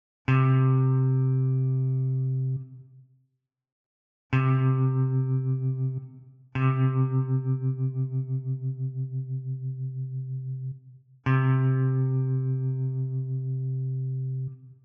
Note de guitare sans et avec effet de tremolo

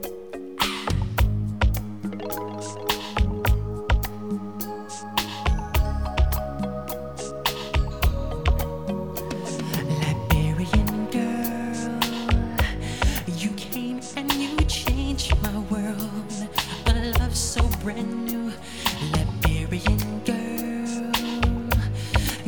Musique volume normal

